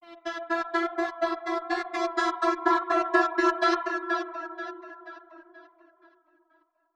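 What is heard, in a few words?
Modulation Processed, alarm siren samples 125bpm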